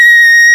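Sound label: SOPRANO HI, CHORAL; FAIRLIGHT CMI IIX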